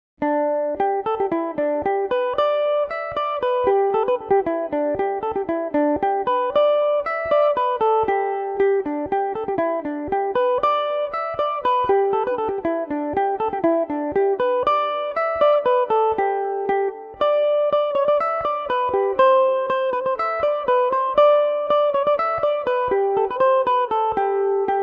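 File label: polka G major, irish mandolin; john brosnans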